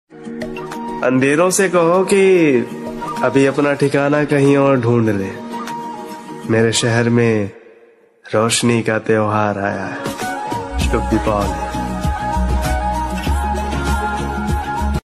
ringtone; happy diwali

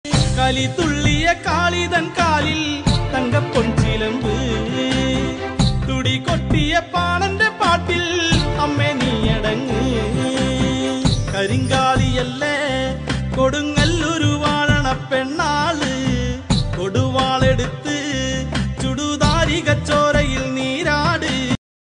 trending malayam song

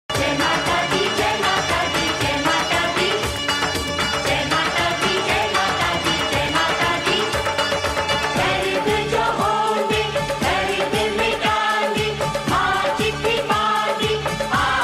hindi devotional ringtone

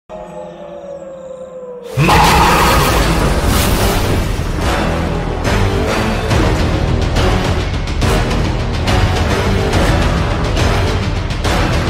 bgm ringtone background music; bhediya; varun dhawan; kriti sanon

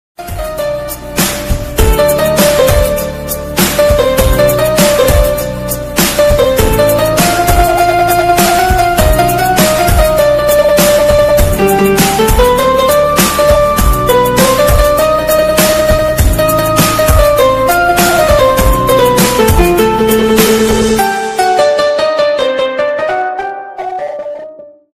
ganpati bappa background music ringtone; शर गणश चतरथ special

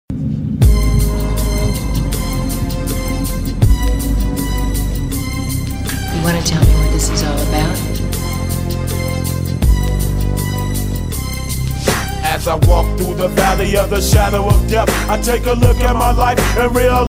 instrumental ringtone

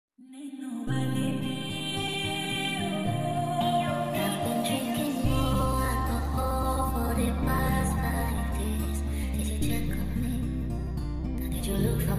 lofi ringtone

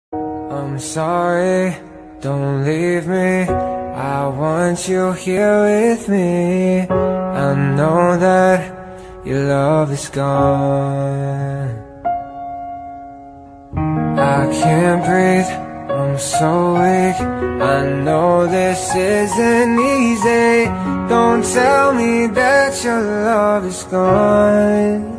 trending ringtone bgm

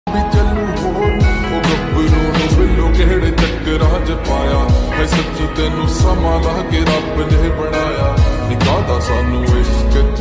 slow reverb ringtone

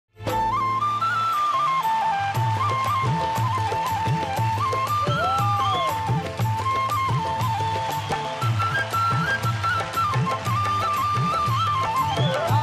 flute ringtone; indian idol

